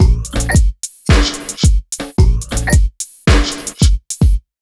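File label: 110 beat, Ragga & Dub Reggae; USB Soundscan vol.27; AKAI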